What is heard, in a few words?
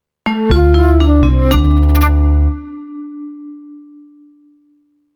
kitsch Flute Stinger, Retro 60/70s TV sounding flute and vibes logo; Stingers; Sound Design